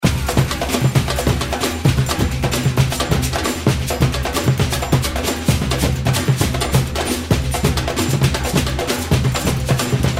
Loop, samba; Baianada

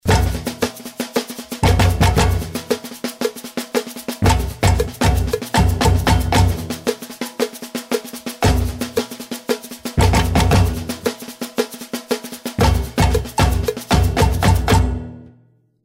Thumb Break Short, samba, Batucada

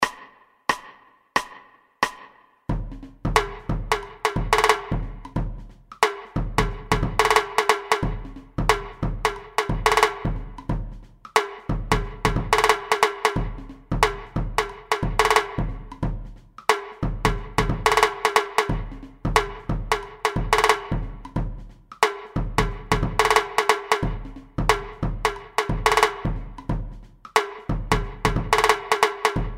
Batidao Repique, samba

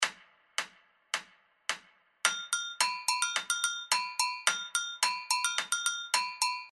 Drop It Funk Agogo; Carnaval; Carnaval2105

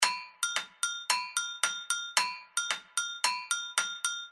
Prefixo Reggae Agogo; Carnaval; Carnaval2105

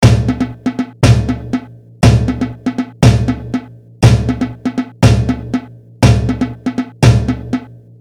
samba reggae call and response

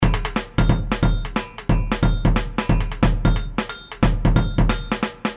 Batidao Breakdown, samba

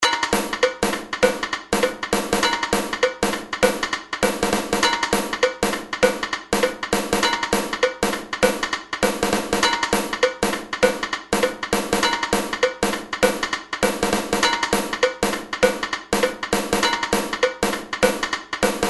Batidao Breakdown Caixa, samba

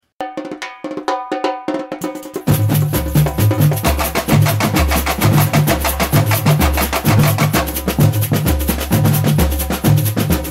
Tambo Desenho, Tamborim, samba, Batucada

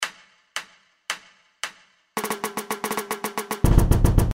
Levada Hands Together Break, samba

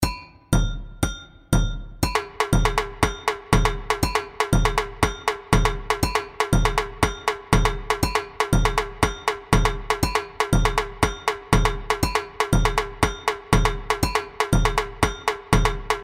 Teleco, Tamborim, samba, Batucada; TelecoTeco

